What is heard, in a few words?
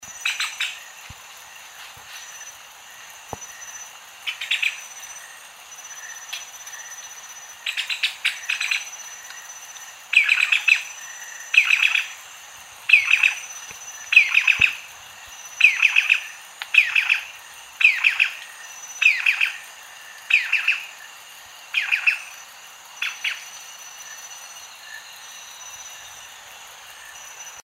Funisciurus lemniscatus Sangha Squirrel Riboned Rope; Rod Cassidy